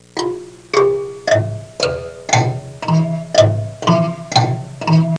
xylophone sequence

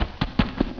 walk; Hexen II; scorpion

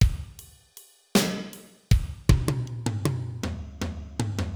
Fill, Blues; Pro Tools Blues

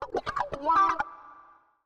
ELECGUIT, HOUSE, 125, A; eJay; Guitar

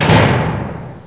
CLOSE

